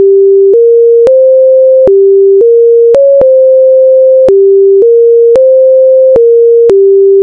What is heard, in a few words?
Normal melody